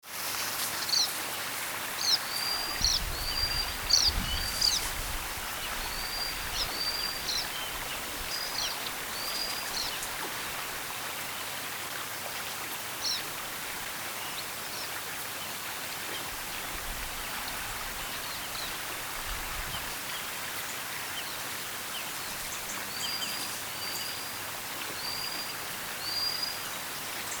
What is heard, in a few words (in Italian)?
Topino